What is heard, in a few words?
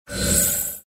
egg disappear; trex; The King Of Dinosaurs